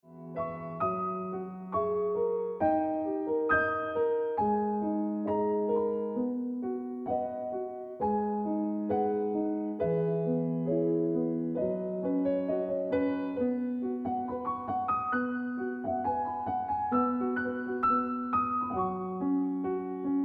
Piano Music

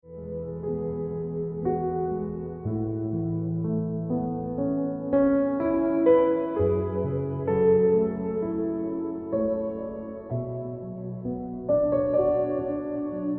Ringtone Piano Music

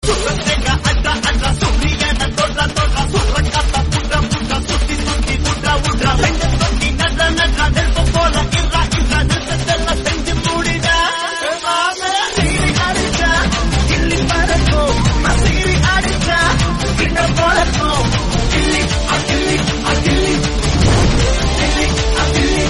Tamil Song Ringtone; Ghilli

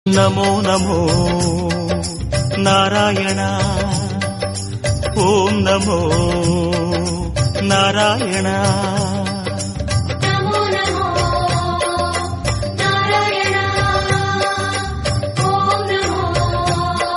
Tamil Devotional Song; ringtone

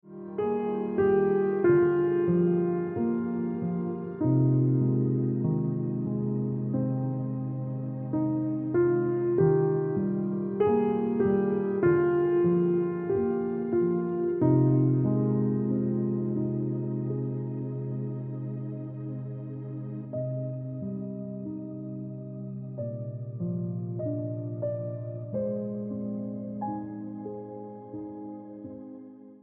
Piano Music

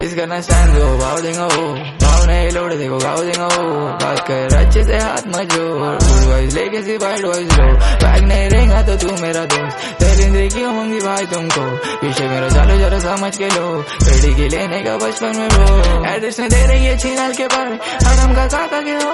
Rap Hindi Song

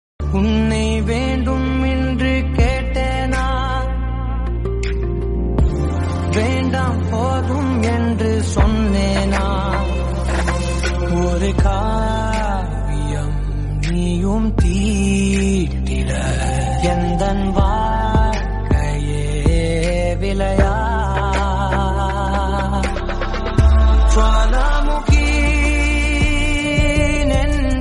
Tamil Song

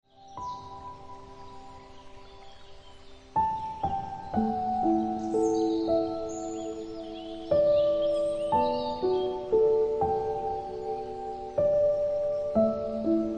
Ringtone Piano Music

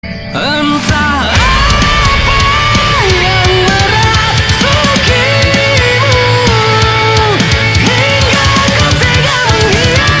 Rock Ringtone

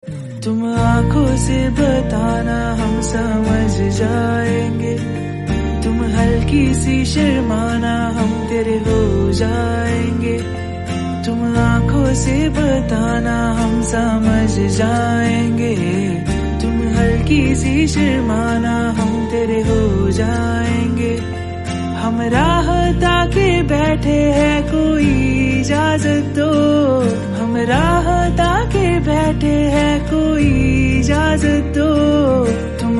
Hindi Song

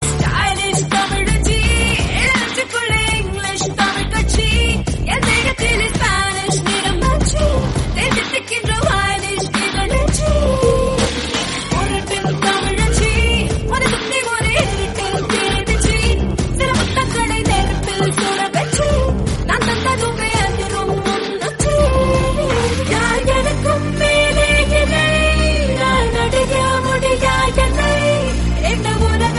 Tamil Song; Arrambam

